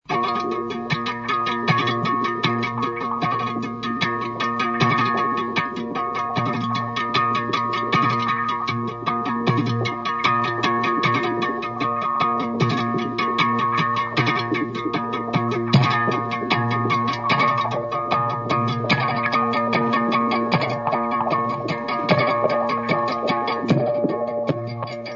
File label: uni sweep